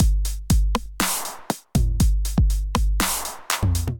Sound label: 909 Kit; Drum Machines; 909 Flavour Kit; Ableton; Factory Packs; Drum Essentials